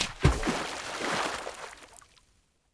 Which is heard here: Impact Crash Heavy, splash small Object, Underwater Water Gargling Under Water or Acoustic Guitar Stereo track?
splash small Object